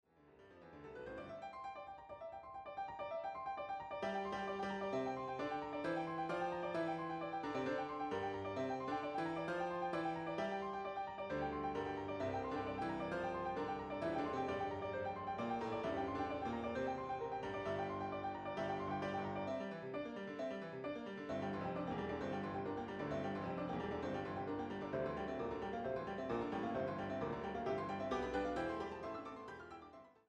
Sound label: 4 Hand Piano